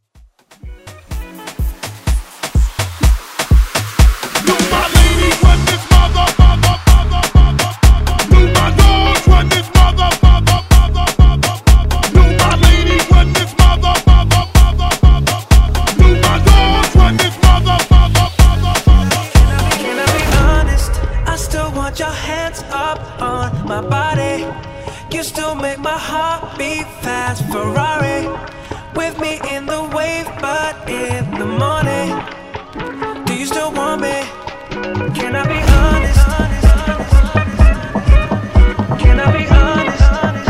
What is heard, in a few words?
Afro Hype; Dj Allan Ferrari